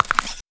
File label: Knuckle Crack; Ed Edd n Eddy; Adromulus Pack; EEnE